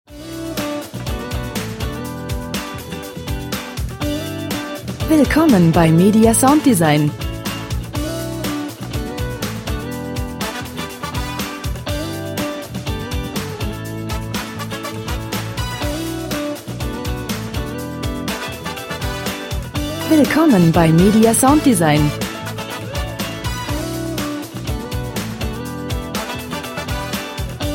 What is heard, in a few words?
pop acapulco nights